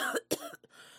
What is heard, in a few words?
cough